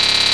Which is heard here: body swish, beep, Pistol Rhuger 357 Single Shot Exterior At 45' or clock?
beep